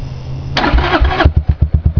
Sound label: start, Buell; Buell Blast; Recordings Taken from the Saddle; Start it Up; Sounds of the Buell Blast!